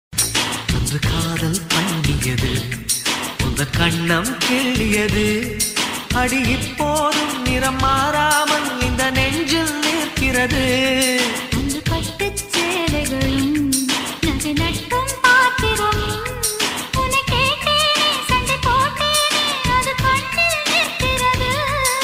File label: song tamil; aasai movie